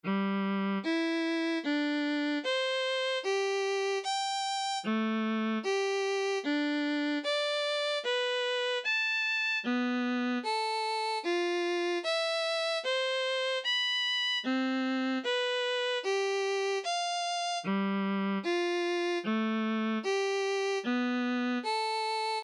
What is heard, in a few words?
sevenths